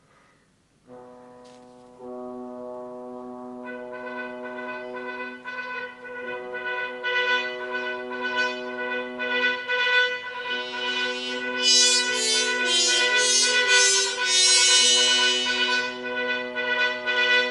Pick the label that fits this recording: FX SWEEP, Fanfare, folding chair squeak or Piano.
Fanfare